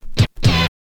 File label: scratch kit, vinyl